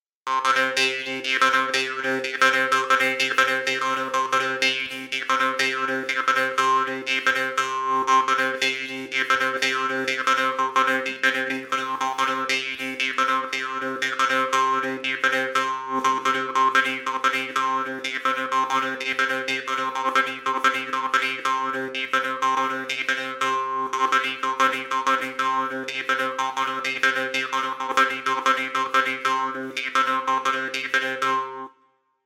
trompa

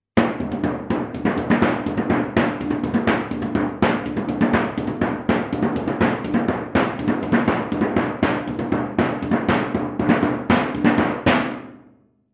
lata

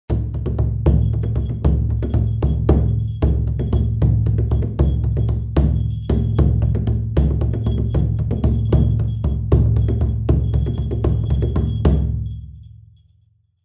pandeiro

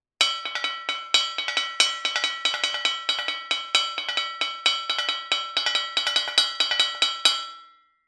aixada

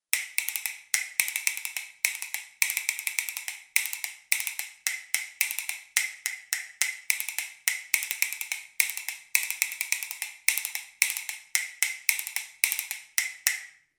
tarranholas

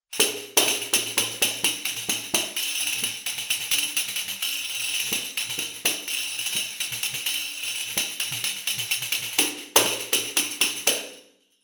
pandeireta

